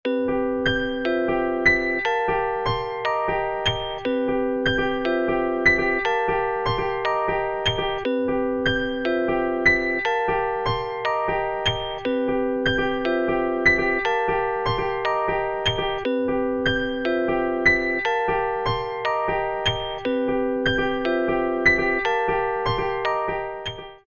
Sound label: Piano Morning, alarms; LG Qua tab PZ LGT32